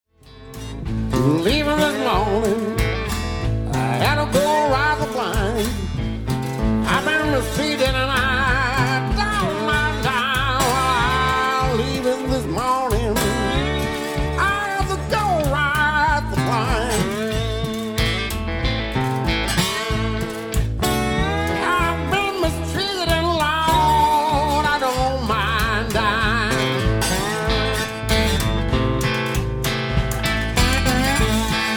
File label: Walking Blues